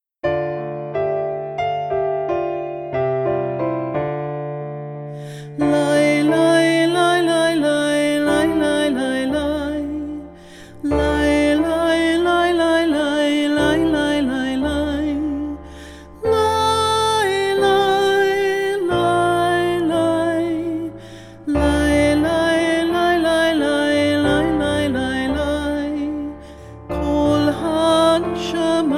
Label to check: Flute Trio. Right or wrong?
Wrong